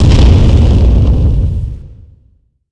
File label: explosion